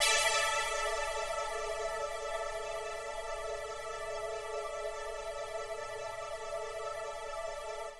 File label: 120 Pad E, Keys, Nu Jazz House; PowerFX